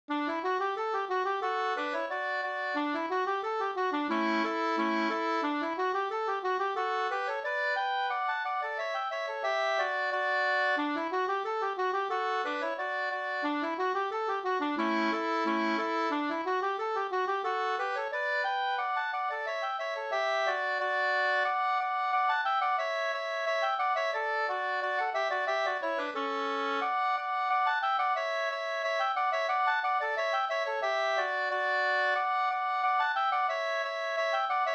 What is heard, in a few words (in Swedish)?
engelska; Valla